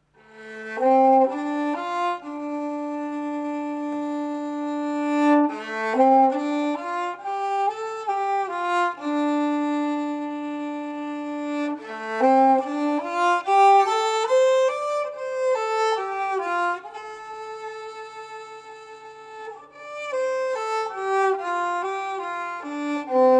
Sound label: Eastern theme violin